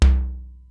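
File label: Simmons SDS-9 low tom, Drum Machines; Factory D5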